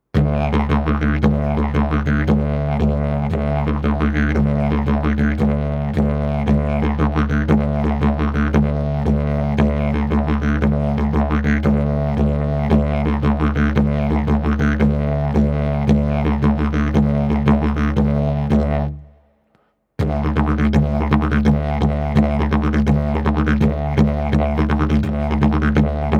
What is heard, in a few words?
Pizzica